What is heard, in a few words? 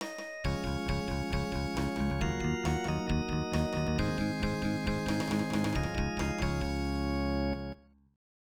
electone; Great Sluggers 94 New World Stadium; namco nb-1 arcade; We Love Marines